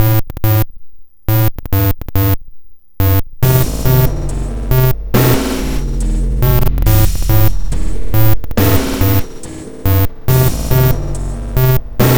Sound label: song for video game